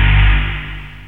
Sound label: Spang Bass; Sonic Foundry (Sony Creative Software); Ugly Remnants VOL-2; Basstuff